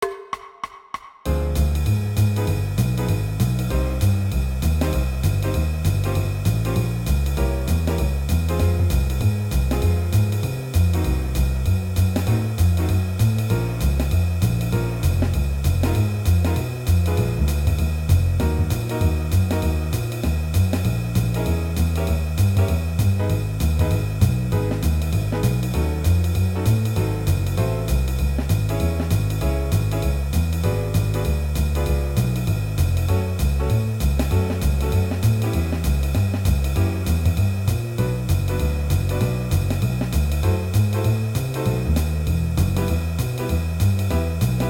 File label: II-V-I in 12 Minor Keys